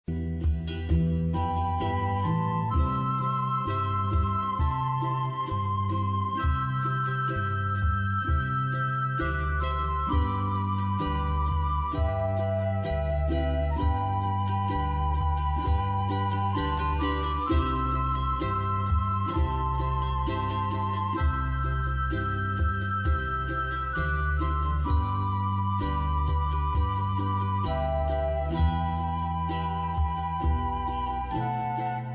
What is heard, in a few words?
Pan Pipes